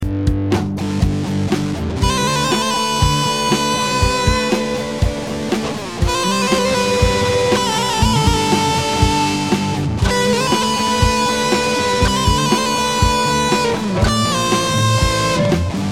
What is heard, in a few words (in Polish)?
tryl przez slide